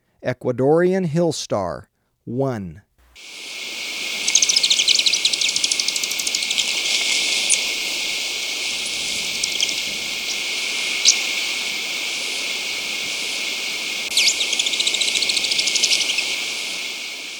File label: Ecuadorian Hillstar, Birds of Ecuador; S. America